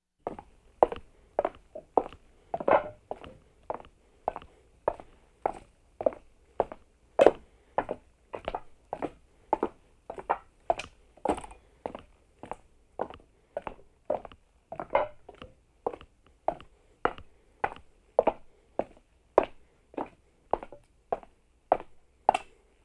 eigene schritte auf holz; Urwald

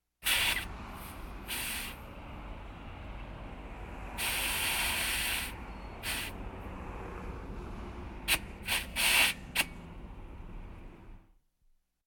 reifen mit luft füllen; Geraeusche

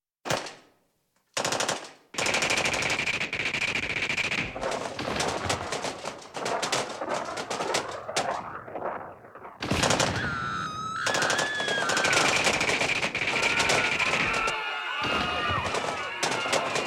gewehre, explosionen und schreiende menschenmenge; Katastrophen

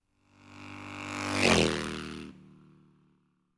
mit 160 kmh vorbeifahrendes motorrad; Auto-Zweiraeder

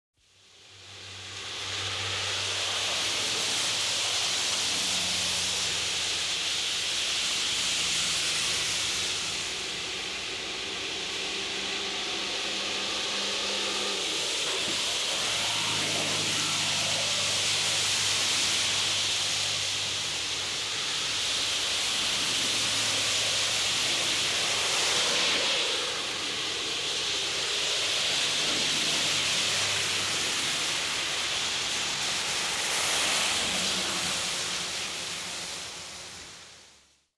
verkehr auf nasser fahrbahn; Großstadtgeraeusche

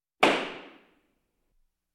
gerichtshammer; Alltagsgeraeusche

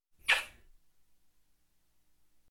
kuss; Alltagsgeraeusche

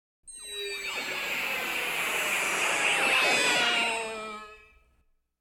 vorbeifliegende energiestrahlen; Science Fiction

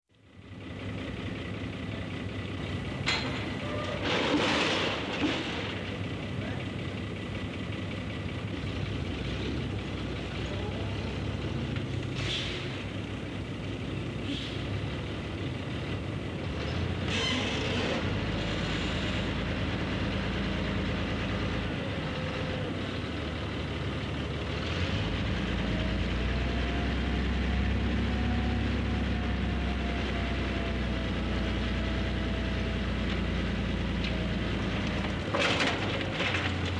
kipper auf dem lande

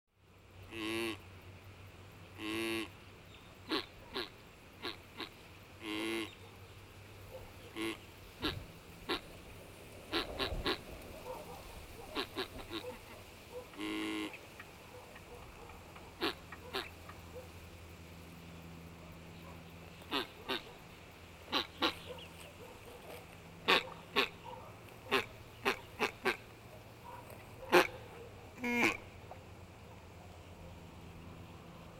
entenjagd, Auf dem Land

